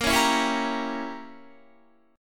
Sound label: A sharp Diminished 7th, Guitar; A#dim7; strummed